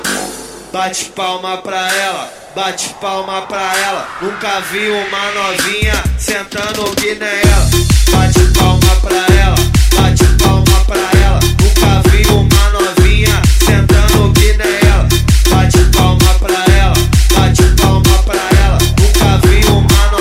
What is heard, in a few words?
sound car